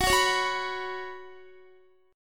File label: F sharp Suspended 4th, Guitar; strummed; x,21,18,18,18,19